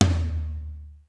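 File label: low room tom; sr16 tom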